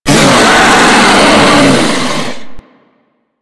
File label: Hammer Boss Angry, Vocals; Warframe